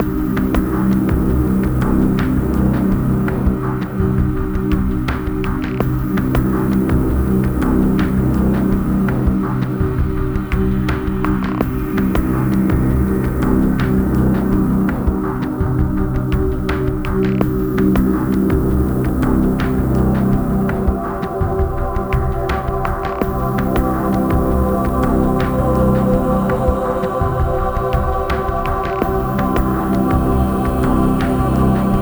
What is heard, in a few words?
Garage Loop; Lamborghini; Xbox